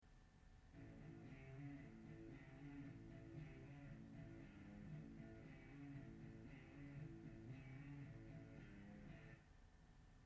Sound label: overdrive